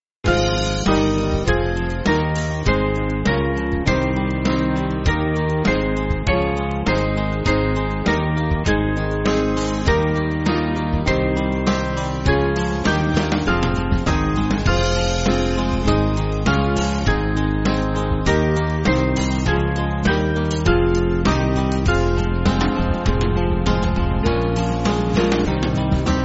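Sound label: Band, Music Backing Files for Churches; Gabriels Message; Angelus Emittitur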